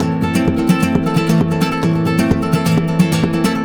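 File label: 130 A rumba minor, Spanish And Gypsy Traditions; Soundscan vol.59; AKAI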